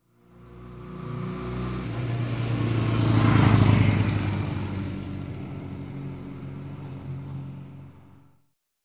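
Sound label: motorcycle passes by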